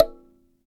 Dyna Sansa, Africa

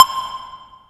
Lead C3; 90s Sample CDs; Club Techno

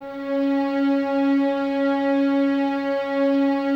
VNS C#4, Violins, String Ensembles; Miroslav Vitous

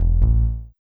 Bass Riff E-A, 137bpm; Big Fish Audio; Old Skool House; OSH Construction Kits; OSH Belfast

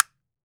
CASTANETS; AKAI S6000 CD-ROM - Volume 5

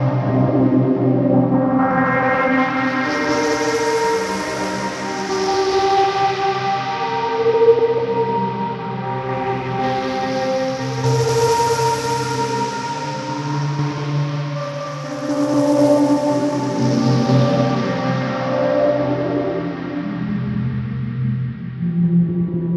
texture heat haze C Min, One Shot Textures; Transmission-X

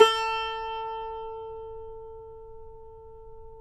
CUMBUS A, Guitars

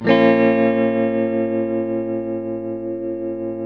TELTMDMIN B, TELE CHORDS, Guitars; Spectrasonics; Hans Zimmer Guitars VOL-1

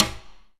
rim click; E-MU Formula 4000 Series Vol. 5; Protozoa; Proteus2 Presets

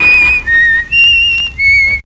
FX LOOPS

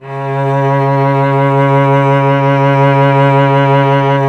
STR VC.C#, Roland LA Composer strings; Roland - LA Composer vol. 1

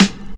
CLAP, Big Beat; Big Beat Samples & More; AKAI; CLAP KIT

